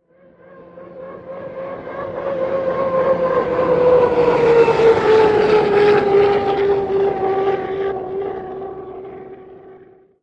Hydroplane By Fast

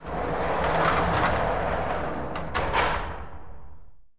Jail Door Large Metal, sliding

